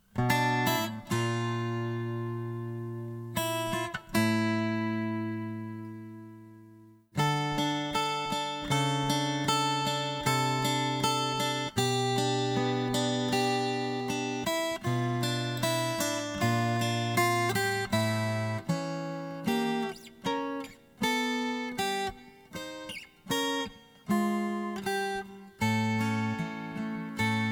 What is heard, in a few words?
Morning Rain Instrumental